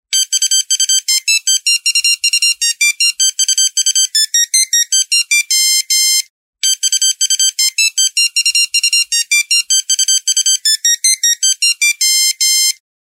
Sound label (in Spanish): melodia